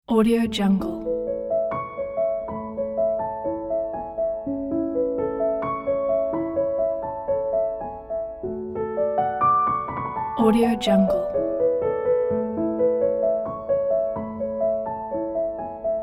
piano space romantic; namirhimmel

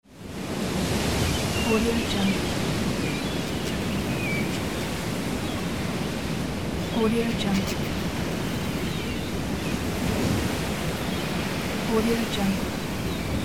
sea ambience

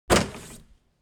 door push open

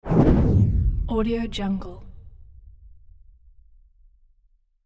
soft explosion energy whoosh; hollywoodedge

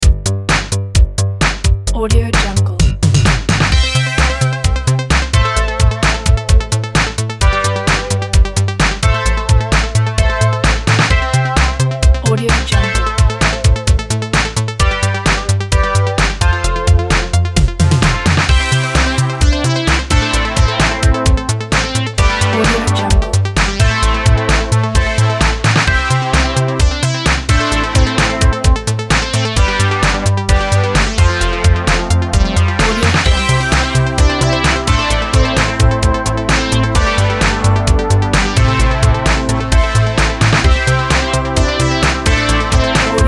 the retro

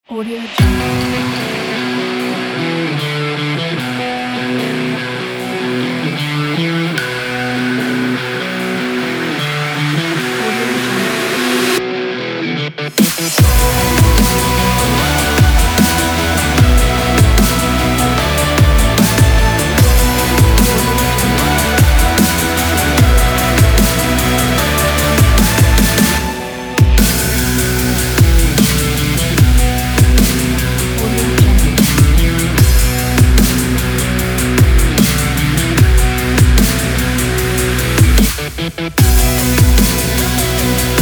stylish rock dubstep

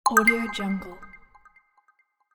next stage

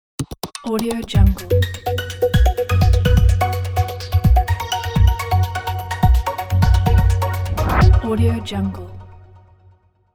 bright tech logo; ronnies cave

